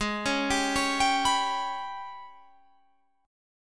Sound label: G sharp Minor Major 7th Double Flat 5th